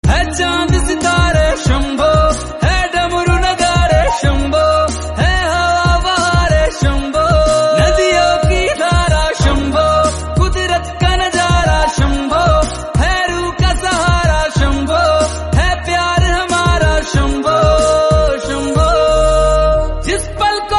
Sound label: Hindi